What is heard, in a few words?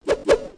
katana idle, weapons